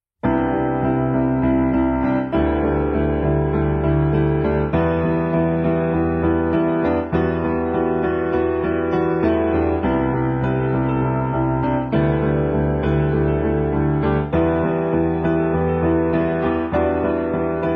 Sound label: Piano Cover